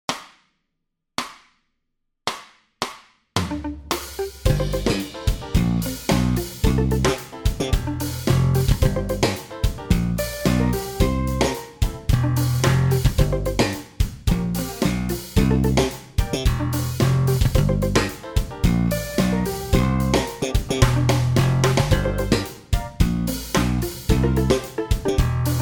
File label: Theme Fast Base Basso